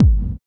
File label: BD ROOM; Best Service ProSamples vol.54; AKAI